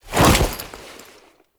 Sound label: Foley Melee Sweep; Warframe; Kahl